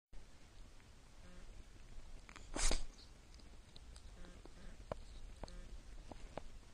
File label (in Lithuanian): kosėjimas čiaudėjimas čiaudi